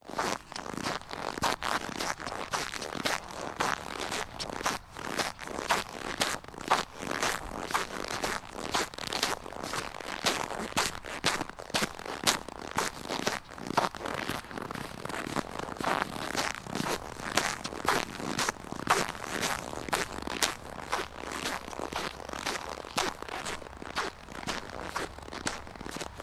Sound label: the crunch of snow